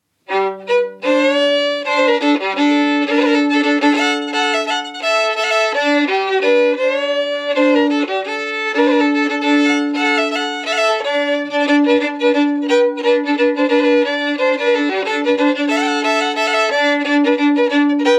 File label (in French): Violon du Poitou